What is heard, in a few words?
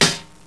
metal, debris